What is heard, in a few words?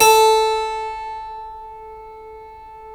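A3 clavinet right, Keyboards Acoustic; USB Soundscan vol.17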